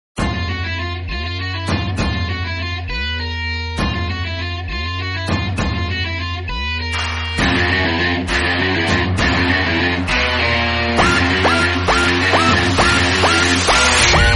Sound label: malayalam nostalgic songs violin